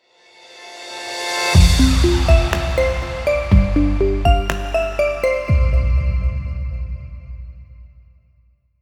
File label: Logo; Aqua Logo B